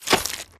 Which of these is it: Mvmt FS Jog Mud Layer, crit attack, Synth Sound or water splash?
Mvmt FS Jog Mud Layer